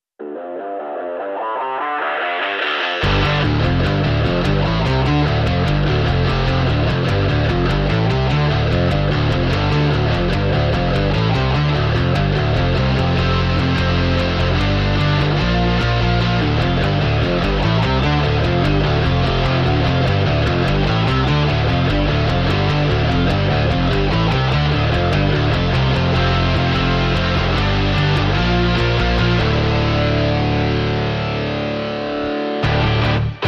Guitars Only

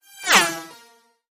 Sharp Razor Sweep, Taylor Grand

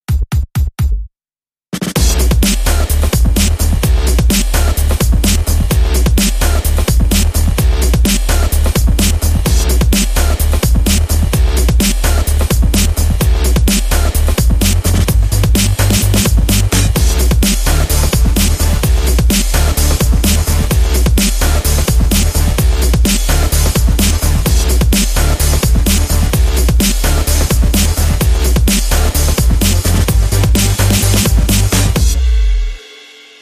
Guitar On Fire Short Rhythm

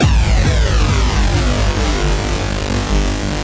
Glitch Techno Roar

